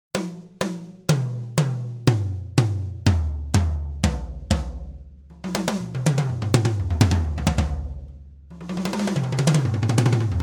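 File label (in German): Toms Komp gate Overheads, Toms wie im Mix mit Overheads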